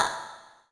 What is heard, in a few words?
Snares, Elektron MachineDrum SPS1 MKII